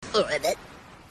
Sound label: Froppy Ribbit